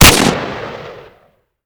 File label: ak74; ak74u